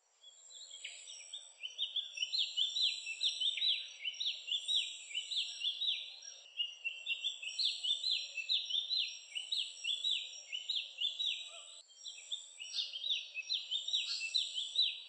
suara remetuk bidadari

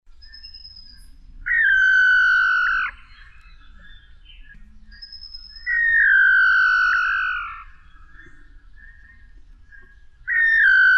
suara giant antshrike

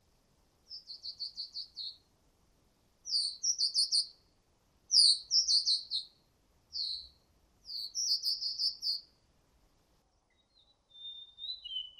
suara ciung batu siul untuk memancing

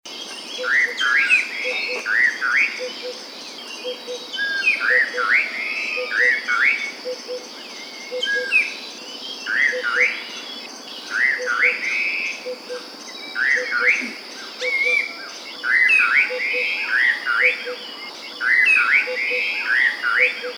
samho

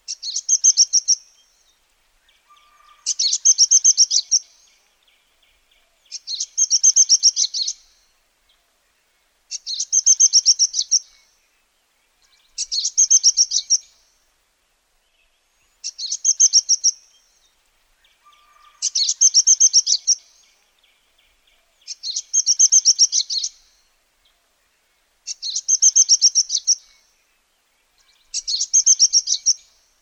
suara ciblek india masteran

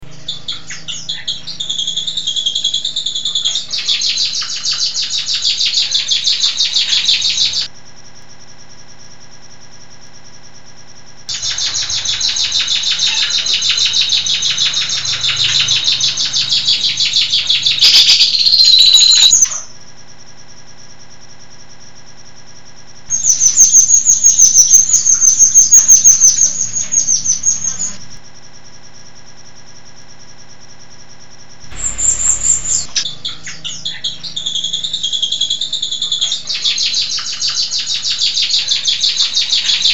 suara burung rio rio isian

